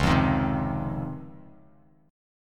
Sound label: C sharp Major 9th C sharp, Bass Standard; strummed; chord; 9,8,13,5; JGuitar